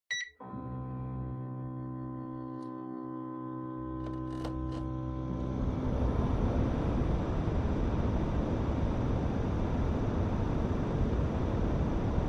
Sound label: Air Conditioner Low